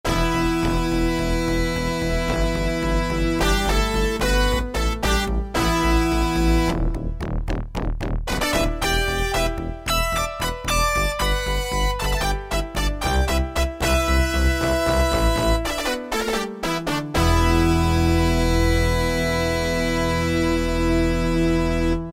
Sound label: HEAVY SPLIT, Casio VZ-10M; Internal factory combi